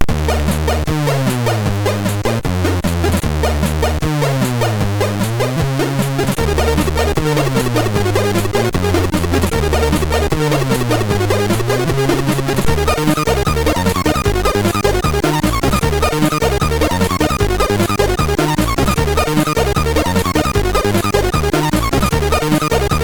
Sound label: Les plus voix, MOS6581R3